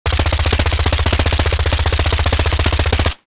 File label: Sterling submachine gun full auto fire; war